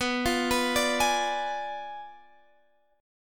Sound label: B Diminished 7th B Guitar Half Step Down